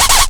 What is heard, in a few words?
noise, FX & ATMO, ALARMS & ALERTS; Techno Trance; Techno Trance Essentials